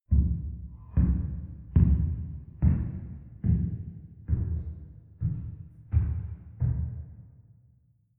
step church wooden stairs medium-fast

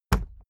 briefcase plastic put down hard; industrie wirtschaft; bueroarbeit

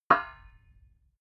metal impact pipe; hits explosionen; metall

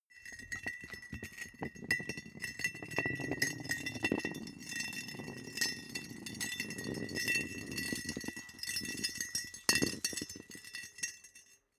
small metal rolling; industrie wirtschaft; metall

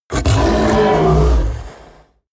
coloss roar loud deep aggressive; kreaturen

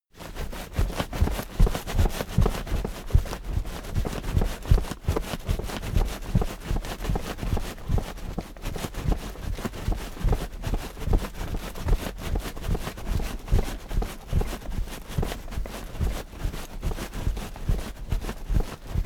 cardboard box movement carry fast; objekte

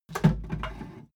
wood board handle roomy; objekte; holz

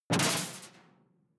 sci-fi alien bubble; swooshes-swishes

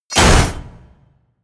slam, tueren

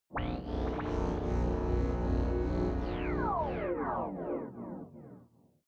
sci-fi night fall small planet, drones

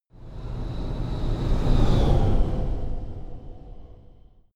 sci-fi vehicle train passing; raumschiff

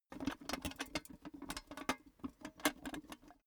cash box metal handle; maschinen; soundpool

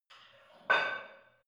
barbell light plate put on hit reverby soft; sport freizeit; fitnessstudio

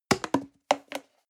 plastic can small fall bounce hard; objekte; dose

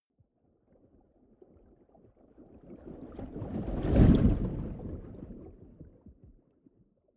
whoosh abstract slow

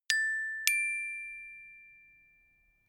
notification glockenspiel fourth up

